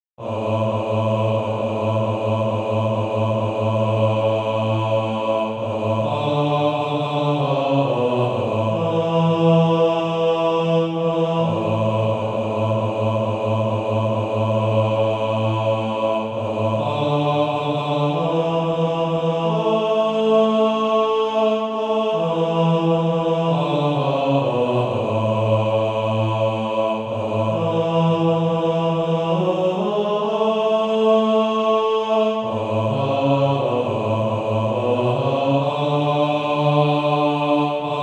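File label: bass, SATB Practise Music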